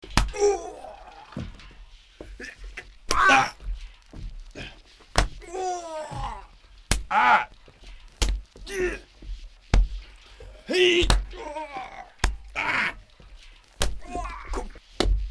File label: fist fight